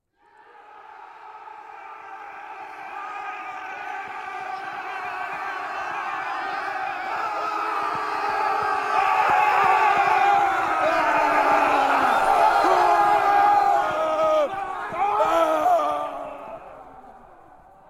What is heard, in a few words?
EXT GROUP Battle Approach, Battle Crowd; Coll Anderson